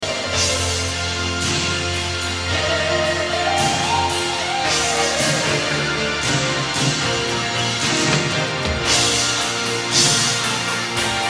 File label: Keys